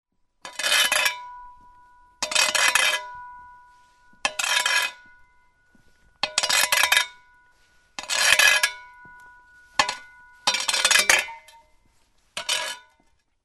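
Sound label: sounds of shovel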